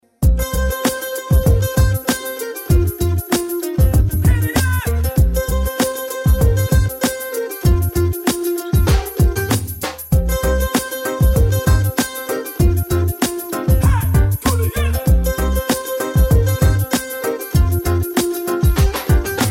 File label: Tamil; Ko